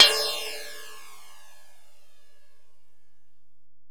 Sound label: Ride, Alesis DM-PRO